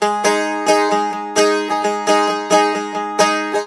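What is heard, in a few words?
G#, bouzouki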